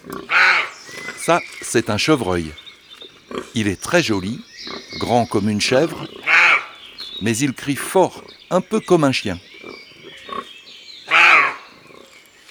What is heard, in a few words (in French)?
Chevreuil, Un jour dans la foret; Imagier